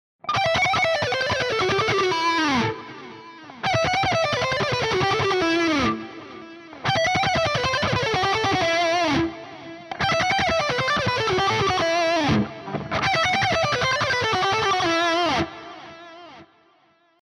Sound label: RGT1270 lead all positions